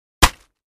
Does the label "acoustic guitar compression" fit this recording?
No